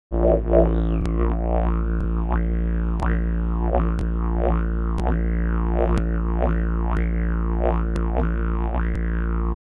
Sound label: Didge